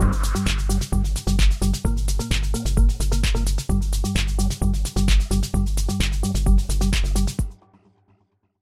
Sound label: stab, techhouse; Dark Angels